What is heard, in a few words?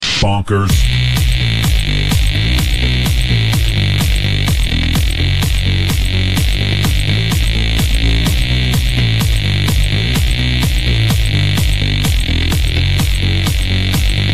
Beat